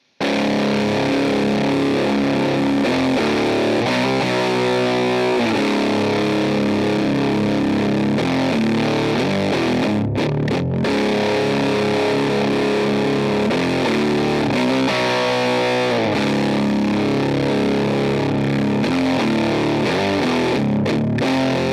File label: di laney 2x12 celestion g12m25; logidy epsi; aftermath; laney irt